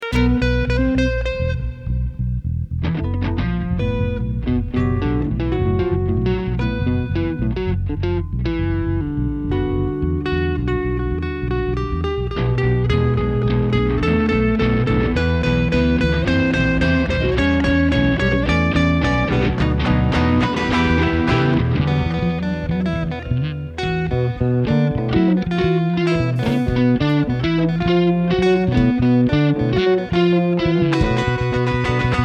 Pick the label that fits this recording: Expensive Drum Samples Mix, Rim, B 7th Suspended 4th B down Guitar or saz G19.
Expensive Drum Samples Mix